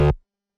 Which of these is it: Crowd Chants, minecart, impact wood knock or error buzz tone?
error buzz tone